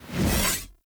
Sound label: Two Handed Axe Whoosh Heavy; Weapons; Enemy; Axes; Sinecure Two Handed Axe